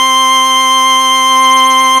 FARFISA; Rubber Chicken Software Sample Bank

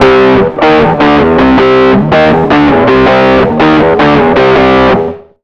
160 H ROCK, Electric & Acoustic Guitar Loops; USB Soundscan vol.04; AKAI

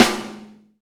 Rock Snare, Roland BK-5; Snares

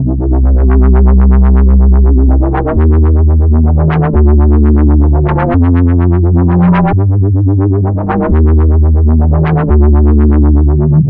Vibes Bass, Drum n Bass; KJ Sawka; Drum n Bass & Beyond

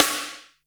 Boss DR-660 Snare, Drum Machines

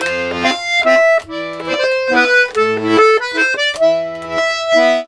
140, Complete Accordions

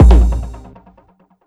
drum, 138, drum-loops, percussionism, Techno

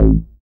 FZ, Synth Bass; SYNTH-Basses; Sound & Vision; Gigapack I CD 2

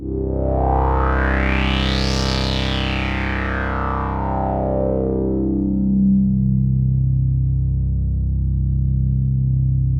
H.SWEEP, BIG SWEEP; Masterbits; Vintage Voltage

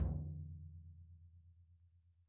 Tom, EMU PX-7 Drum Machines

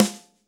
SD, KIT Real-Kit

